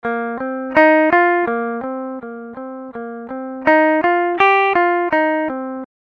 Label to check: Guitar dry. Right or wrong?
Right